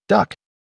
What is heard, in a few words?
Duck; on tap ve chu de dong vat